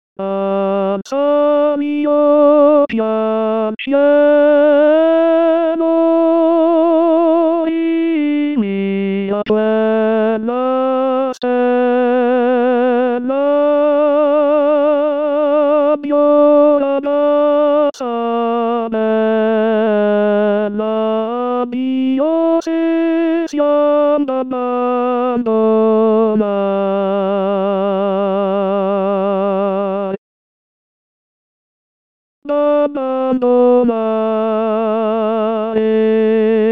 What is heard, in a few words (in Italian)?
tenore solo